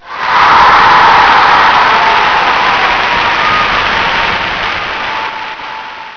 Victory; Grunt

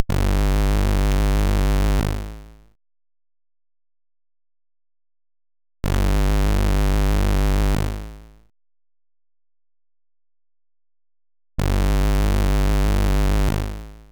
Jaws Imitation; SIDTunes

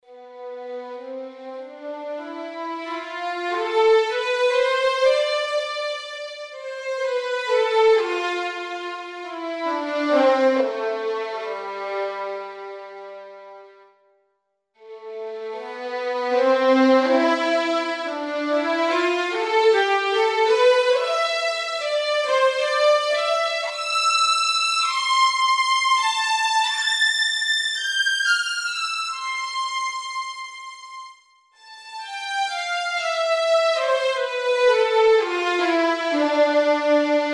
violin portamento; Halion String Edition; violins portamento

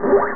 powerup; EuroCD 3; Scavenger II

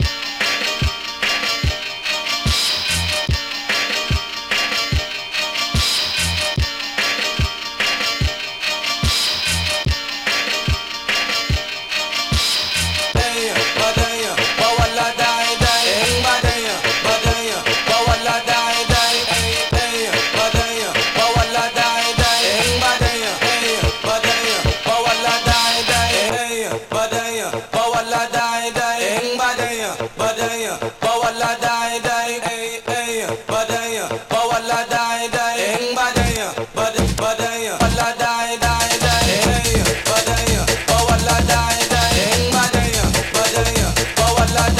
Ragga

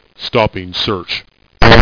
stop

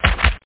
snare; OctaMED SoundStudio V1; jazzies samples

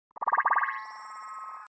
Dwindle; Alex & Emma Press Kit

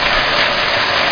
ski; NewSurfs

